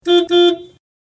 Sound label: car beep x2